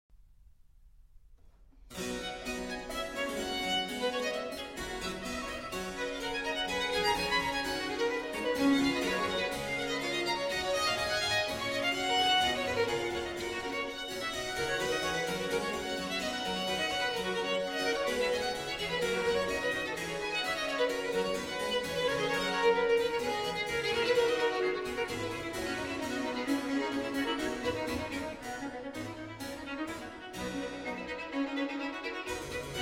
Trio Sonata in C Major, Allegro, for 2 violins and basso continuo; BWV 529